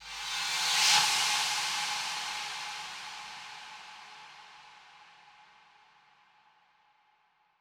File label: Heavenly Kit 137BPM Reverse Fx, A major; Sound FX